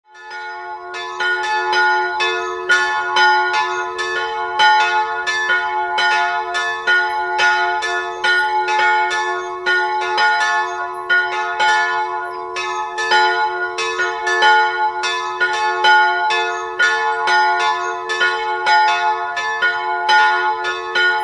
Oberhofen, glocken

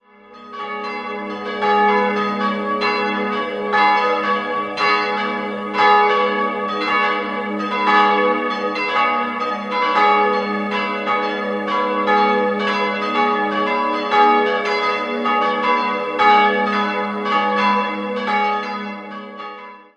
Hirnstetten, Filialkirche St. Leonhard, glocken; in Erding gegossen; Ingolstadt; 1873; Gießerei Eduard Becker

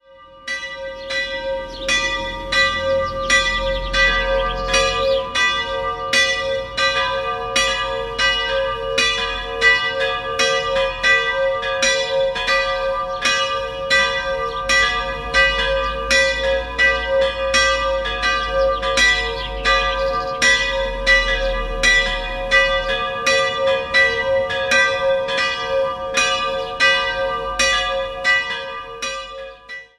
Wörth bei Schwindegg, glocken

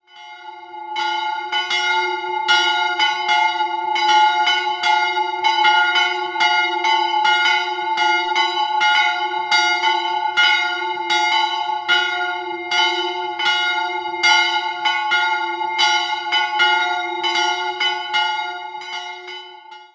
Limberg, Glocken

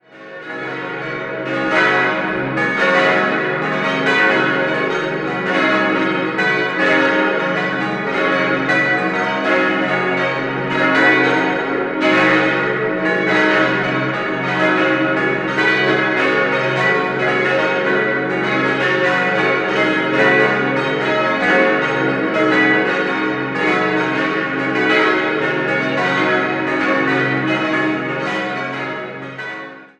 Roppen, Pfarrkirche, glocken; Pfarrkirche St. Leonhard; es'-as'-b'-des''; Grassmayr in Innsbruck gegossen

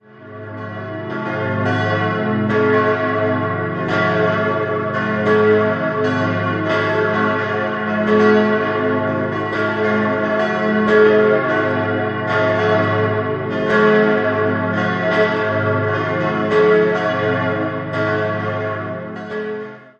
Dagmersellen, glocken

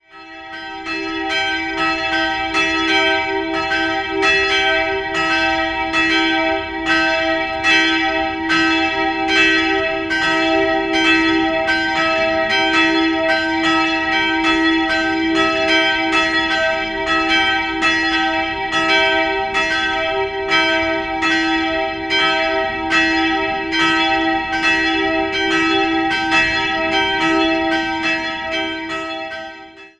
Altenfelden, glocken

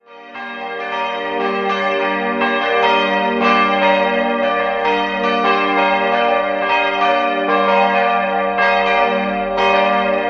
Holzheim Forst, Glocken, Filialkirche; Filialkirche St. Ägidius; Friedrich Wilhelm Schilling in Heidelberg gegossen und sind dem Hl. Ägidius, sowie Maria und Josef geweiht.

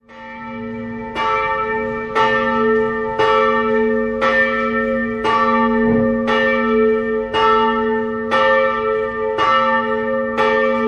Regensburg, glocken; Oswald

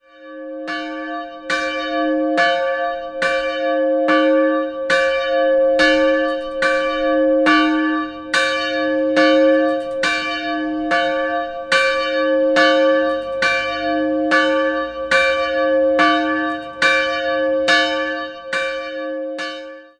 Rübling, glocken

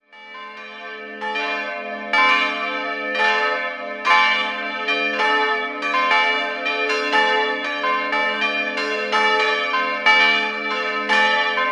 Ingolstadt Lukas, Glocken

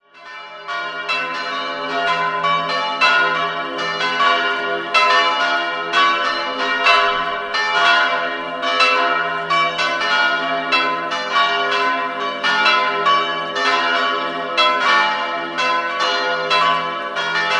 Steinkirchen, Pfarrkirche, glocken; Pfarrkirche St. Anna; Karl Czudnochowsky in Erding gegossen, die c''-Glocke stammt aus dem Jahr 1920 von der Gießerei Oberascher.

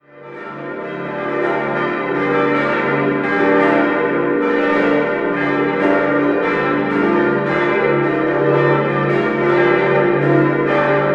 Augsburg-Hochfeld, Glocken; Canisius

